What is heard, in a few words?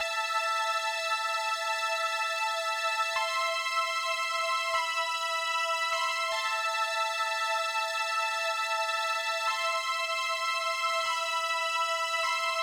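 Hip Hop Strings, Amin, 076 bpm; Pro Tools Essential Loops; Digidesign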